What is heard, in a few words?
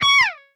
slide